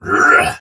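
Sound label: Exertion Soldier Sword Agent Male, Battle; Dialog; Barks; Melee; Sinecure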